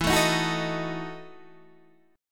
E Major 7th Suspended 2nd Suspended 4th E, Guitar, Open D; chord shape